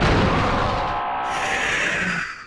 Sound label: jumpscare sound; two night at scars 2